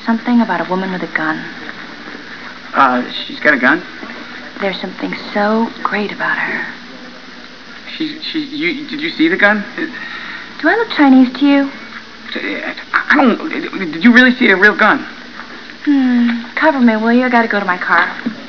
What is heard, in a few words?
gun